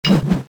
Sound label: draw, weapons; csco; csgo